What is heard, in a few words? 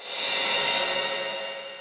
drum hit whistle